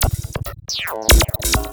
percussion loops, Techno, 138; PS-45 WAV Techno ID

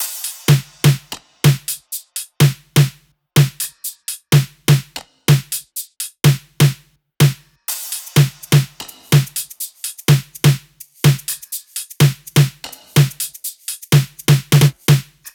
loop top 125; Seismic Loops

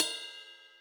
ride, Millenium MPS-400

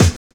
kick, Drum and Bass; EZ Rollers; Kick Set 3; Instrument Samples

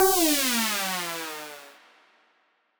Siren Horn down, Ramp Down, C; future rave

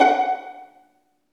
String Vlns Pizz

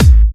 Electro Kicks

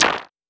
Voyager Snare, Moog Voyager

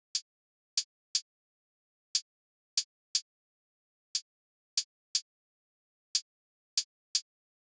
Closed Hihat, 120bpm D; Zero-G; Nu Disco; Happy People

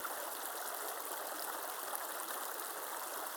Waterway G Light

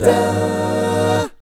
guy chords, vocal, jazz; ILIO; Vocal Planet VOL-3; Jazz & FX

